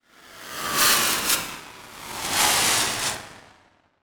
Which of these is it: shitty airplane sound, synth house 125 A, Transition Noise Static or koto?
Transition Noise Static